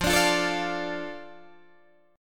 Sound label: F 6th F down Guitar Open D